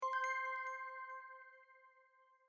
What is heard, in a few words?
new chat message